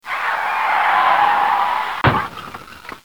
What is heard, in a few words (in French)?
Crash mur voiture